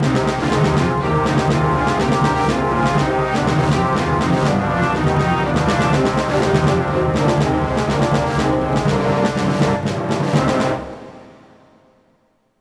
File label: Duel Intro Music